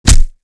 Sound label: impact flesh; Modern Warfare